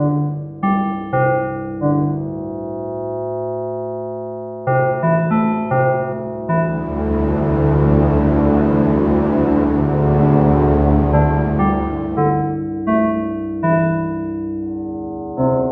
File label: FM Synth; Synthétiseur